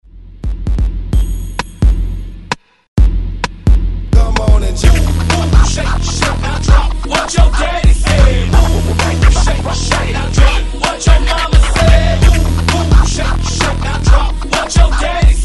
Hype Scratch 130Bpm; DJDOLLS

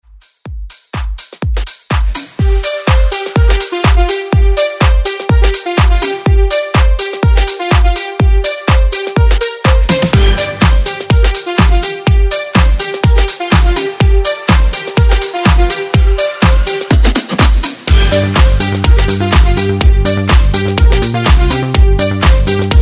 House Redrum

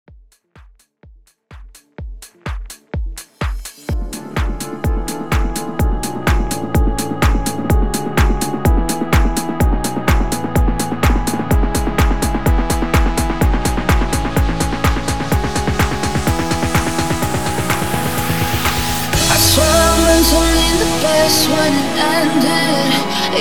126 BPM; MASHUP

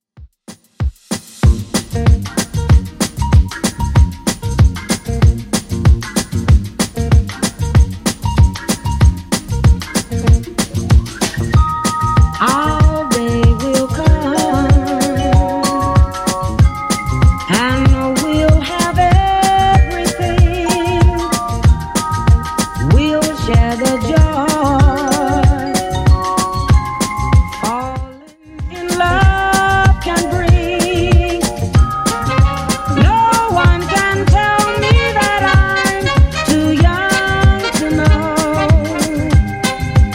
60s Redrum 190bpm

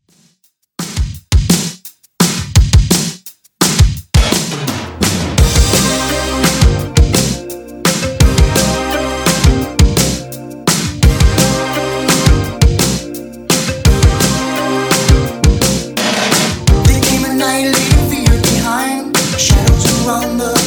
80s Redrum 85bpm

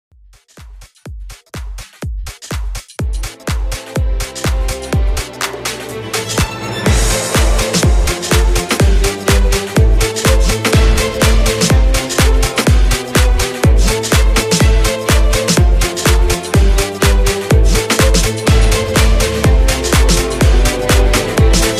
House Dance Mixshow 124 BPM

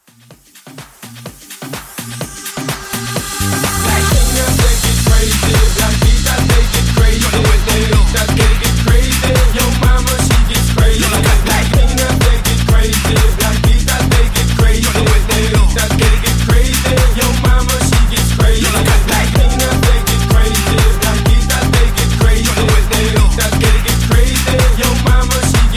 Latin Mashup

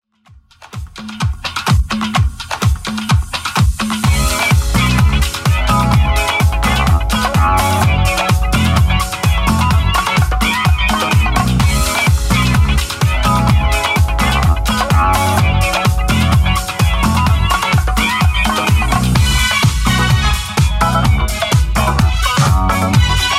70s 127bpm; Xtend Edit

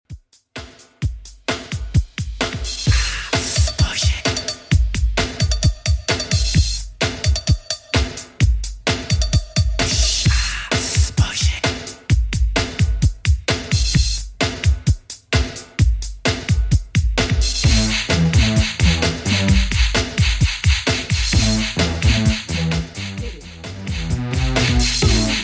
80s Redrum 130bpm CLEAN

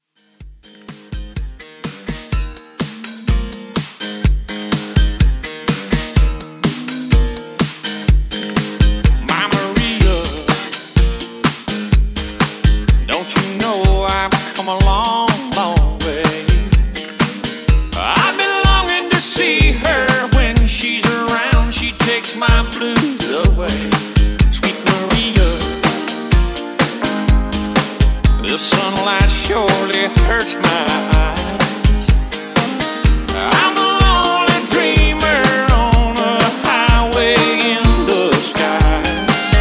Country Redrum, 125 bpm

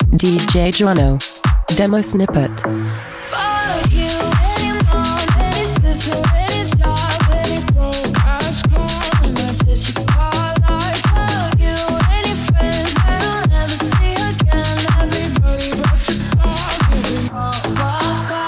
125bpm E

